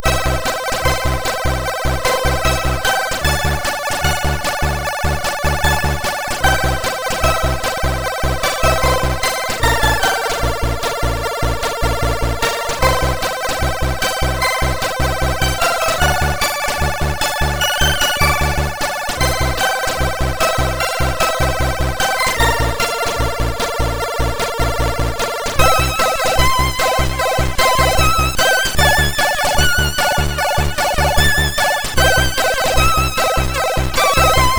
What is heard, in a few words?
sid MOS6581R4; hvsc